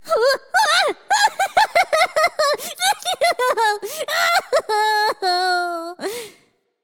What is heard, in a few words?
fear; Smite; ymir v2 skin19